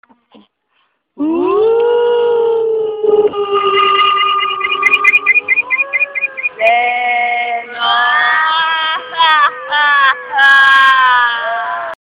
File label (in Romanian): Mini-mesaj Horror